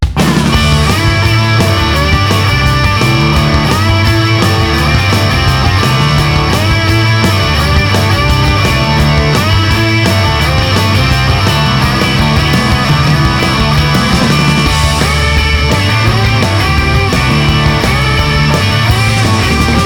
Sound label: ROCK track Music; FILM